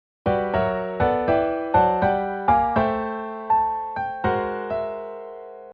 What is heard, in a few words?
polka tritone